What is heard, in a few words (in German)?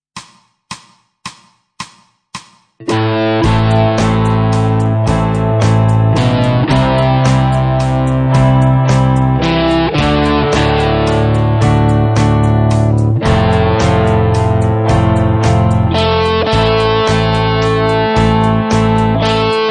E-Gitarre